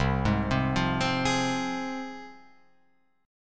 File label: C Suspended 4th Sharp 5th C Guitar Open F, Csus4#5 chord; Csus4#5 arpeggiated; 0,3,0,0,3,3; JGuitar